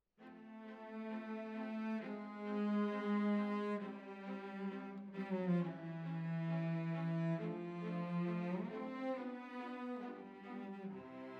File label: Cellos Over